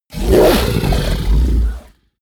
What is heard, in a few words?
fishman vocal snarl; kreaturen